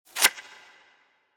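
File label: Digital Card; Yu-Gi-Oh! Master Duel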